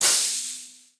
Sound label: steam; abilities